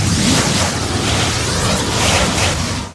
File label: mini tornado; weapons; dota; invoker